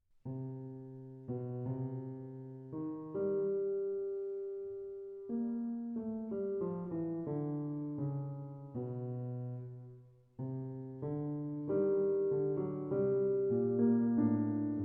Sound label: Fugue in C Minor, Two-Voices Exposition